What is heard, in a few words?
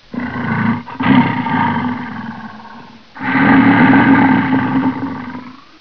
idle, garg; svencoop; gruntsparty